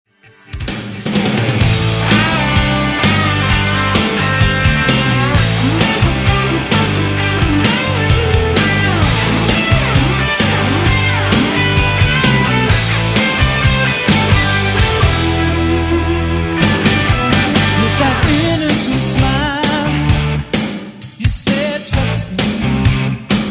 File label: melodic